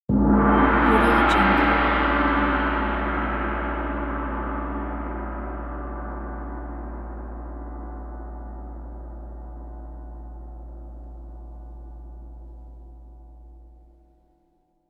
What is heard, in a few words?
gong; arialiciyan